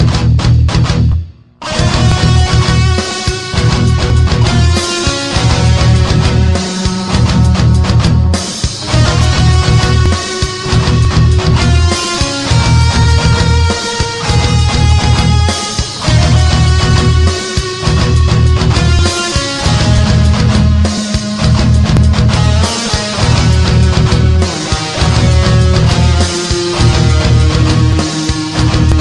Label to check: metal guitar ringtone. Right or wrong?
Right